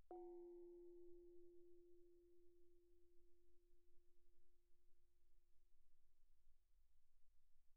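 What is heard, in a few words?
Thai gong F4 pianissimo, Percussion, gongs & tamtams; thaigongs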